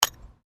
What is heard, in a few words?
Digging Small Handheld Tool Rocks